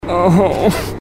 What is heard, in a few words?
grunt; oney